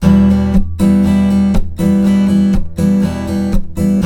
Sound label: RIFF, Blues Guitar; ILIO; Fretworks